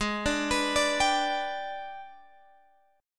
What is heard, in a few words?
Ab Minor Major 7th Flat 5th, down, Guitar, Open G